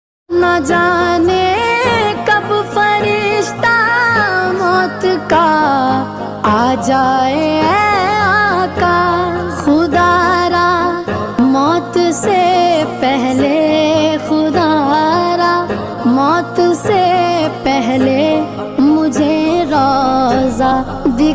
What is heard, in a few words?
islamic ringtone naat sharif ringtone islamic tone